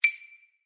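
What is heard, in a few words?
htc notify woodblock; ringtones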